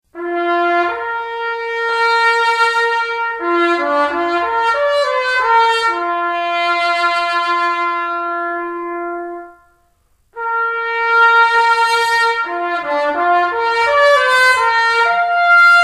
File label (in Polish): hejnal; chelm